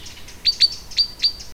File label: verso canto fringuello fringilla coelebs chaffinch; Verso "twin twin"; buchfink pinzon vulgar; bioacustica